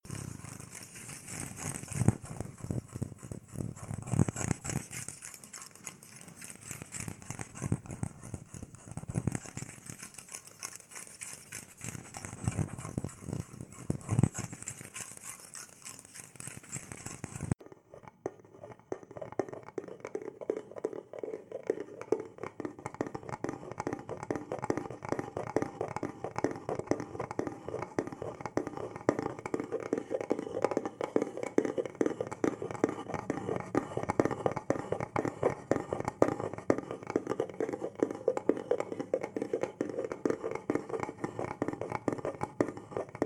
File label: ASMR